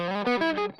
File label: Strum Line